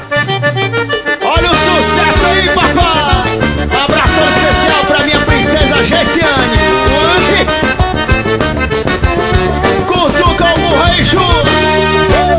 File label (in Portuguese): forro das antigas